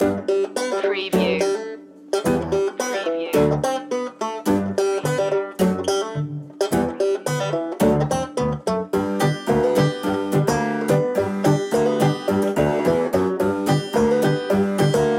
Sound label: shimmy